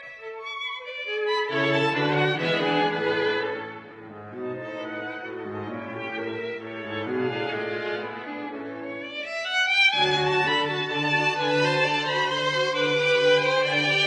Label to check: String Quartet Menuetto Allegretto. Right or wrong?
Right